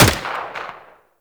Rolling Drone Turret Fire; Warframe; Grineer

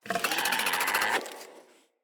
Infested Charger Idle, Enemies

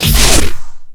Door Close; Galba

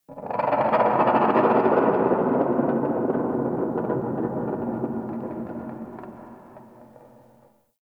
Beast Cry; Warframe; Tau

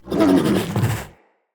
Corrupted Battle Dog Stun Start

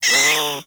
Catbrow Feral Vocal Pain, Enemies; Warframe

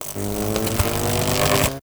Lightning Strike Charge; Warframe; Teralyst; Rainalyst Abilities

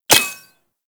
Pistol Mech; Guandao

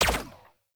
Drone Fire; Warframe; Caliber Chicks Minigame

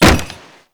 Desk Slam; Lab; Lyon; Warframe; Tau; Protoframe Room; Ambience

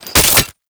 Pistol Reload; Weapons; ScaldraPistol; Warframe

